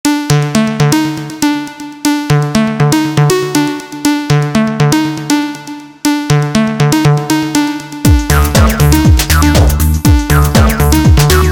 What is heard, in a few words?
victory